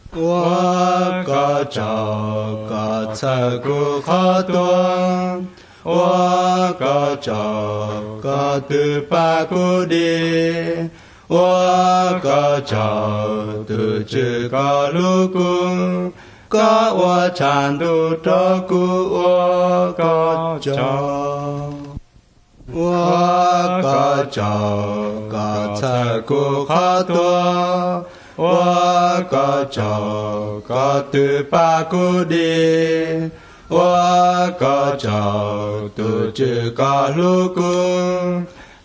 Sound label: songs hmong white